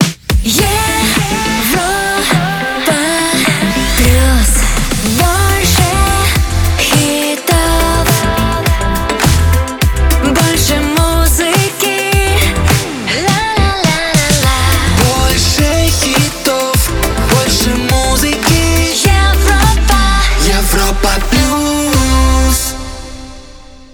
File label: Pop Rhythmic 104bpm C; Europa Plus 2025